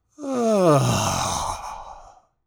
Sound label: Couch sigh; Foley Library; People Sounds; Judge John Hodgman